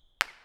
Fireworks Pow; blockade classic

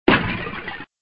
Broken Ice

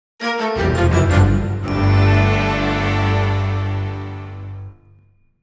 Jingle Deduction Complete

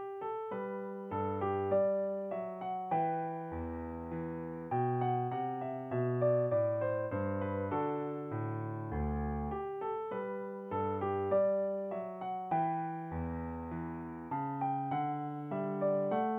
Gavotte, piano; Piano app by Yokee

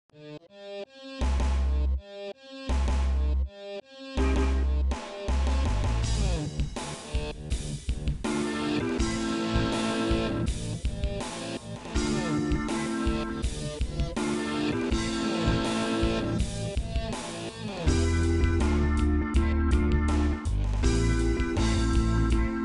Rock And Roll; Shapes 2

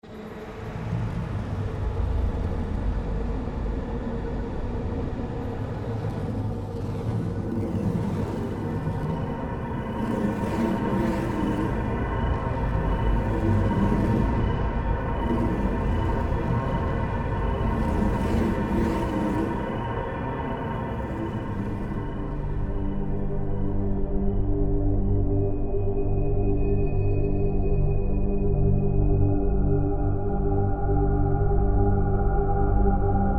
race; Galactic Civilizations II

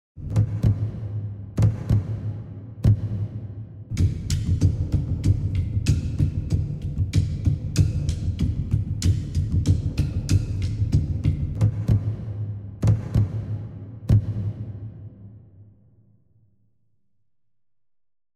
Drum D; World of Warcraft; Dragonflight; Dragon Isles

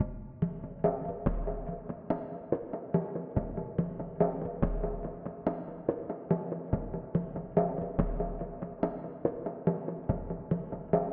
Djemba; The Sinking City

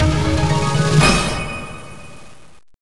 News Headline; WK Voetbal Avontuur 98

